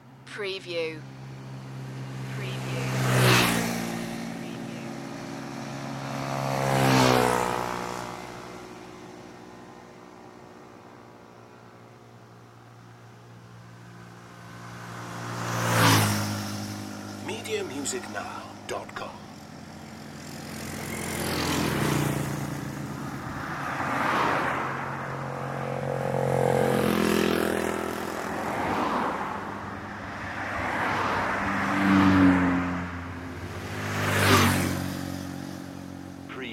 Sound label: passing motorcycles and cars loop